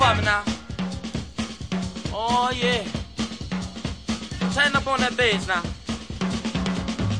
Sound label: 133 bpm, tighten up that bass, drum loops and breaks